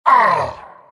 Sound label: grunts; abyssal underlord